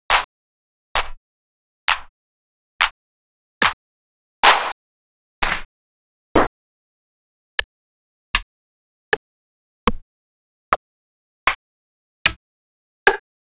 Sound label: Vinyl; X-Static Goldmine